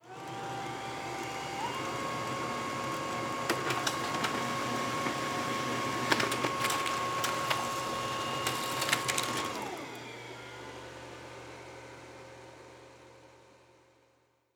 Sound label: Laser Printer